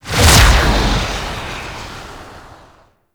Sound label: Fly By Quick, Vehicles; Flight; Warframe; Liset; Stalker Liset Skin